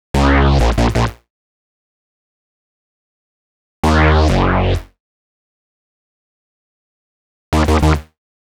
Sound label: 130 D#m bass, Complex Electro Synths; Prime Loops; Bias